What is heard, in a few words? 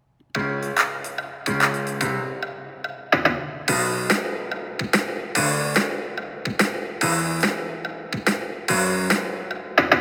Instrumental